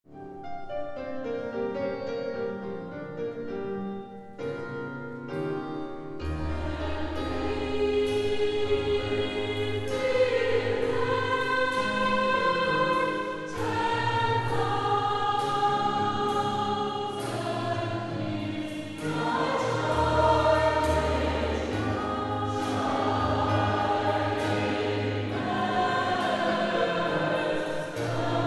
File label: Gospel Voices live